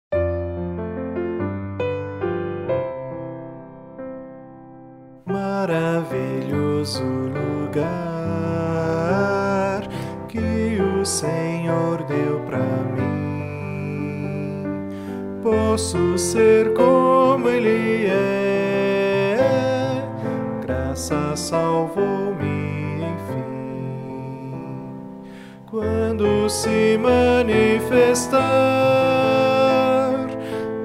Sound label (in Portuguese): voz piano